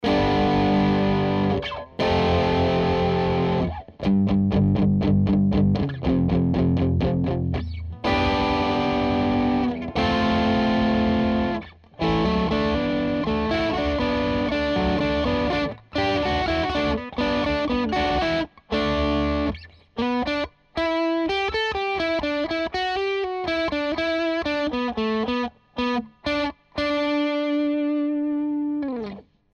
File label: Overdrive Guitar